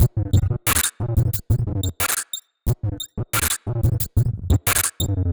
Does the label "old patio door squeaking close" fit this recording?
No